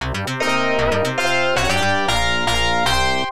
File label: Lost Game (Game Over)